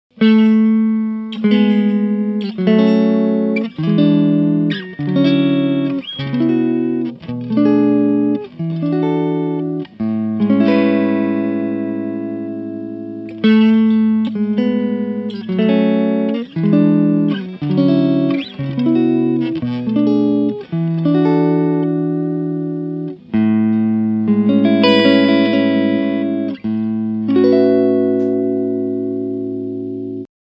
vibe

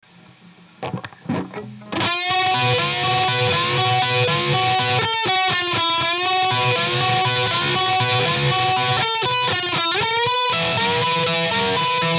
lead

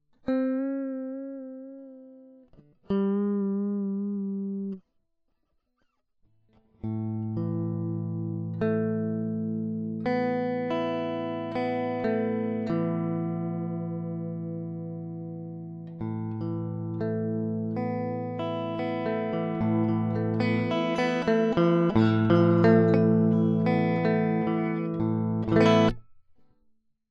VOX DI Chords Tuning

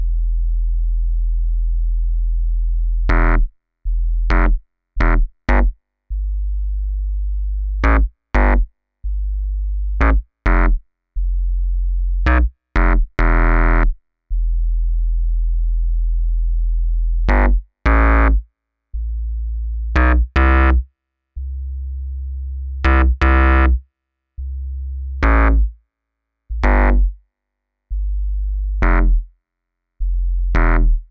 cre8audio West Pest Fold Mod

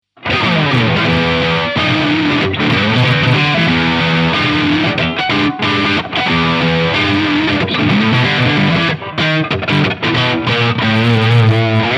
Single Coil Both Channel; Wampler Gearbox

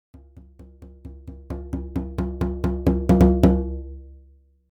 Culture Tam Drum2 Velocity

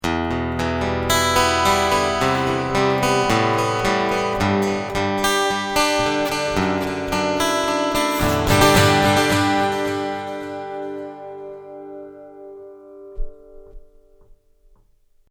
Delay

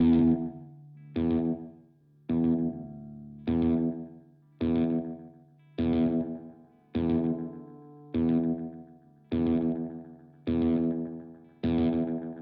MXR Carbon Copy Regen

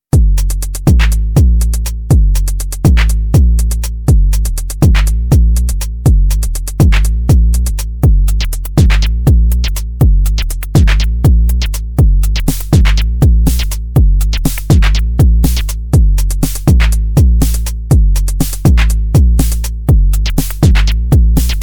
MFB Tanzmaus LFOs mute

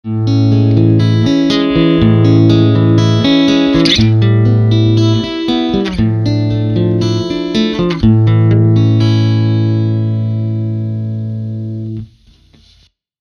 Walden T550 more Treble

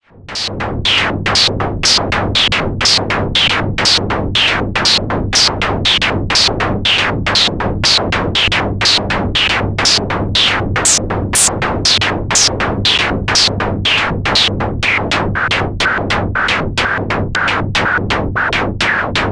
Filter MINI; Arturia

